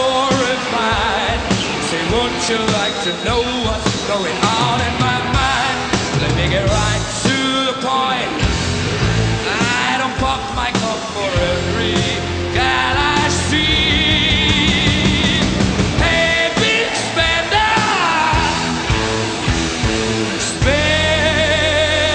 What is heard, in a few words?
rock